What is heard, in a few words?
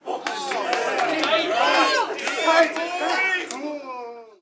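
Street Ball Crowd Cheer Medium; Crowds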